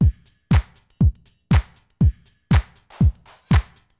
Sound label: BEAT, DANCE DRUMS; MAGIX